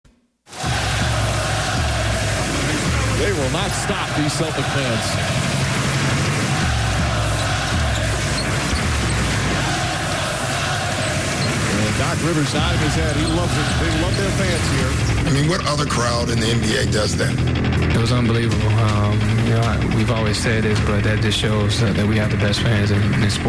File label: chant